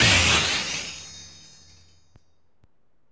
GAME BREAK SLIDE; maimai

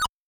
piyo; 主播女孩重度依赖

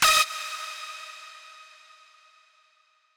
lead

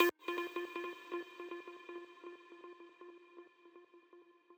arp

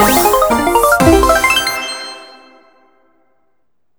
SE MAP SELECT GOAL PICTURE; maimai

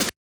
fill

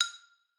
xylo

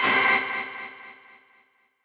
stab

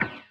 breakbeat

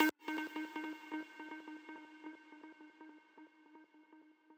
arp

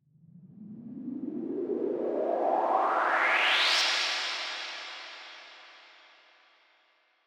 fx cinema

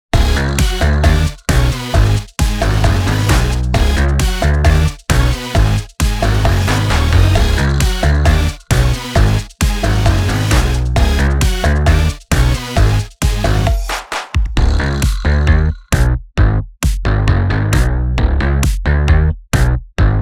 off vocal -3

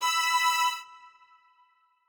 strings

